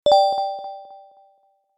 Notification bell delayed ding

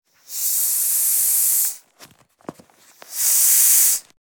Rubber ring deflating air release; inflatable

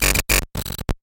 Soft electronic glitch

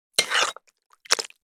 Breakfast cereal with milk spoon scoop and eat

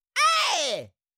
Cartoon little monster voice shout scream short

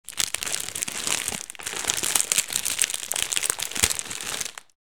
Plastic packet crackling and rustling

